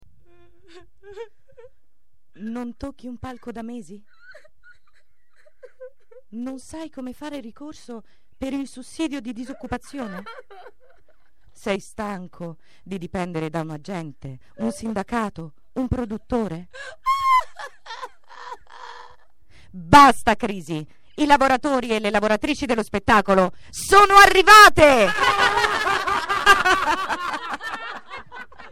pianto

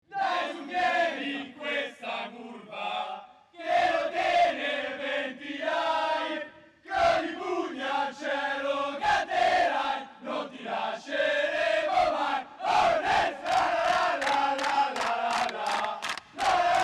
coro

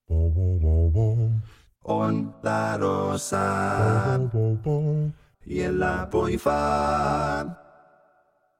coro; Ondarossa

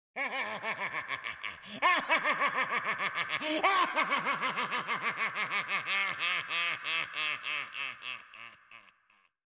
Risata pazzoide

